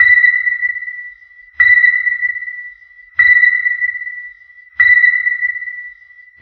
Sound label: new sonar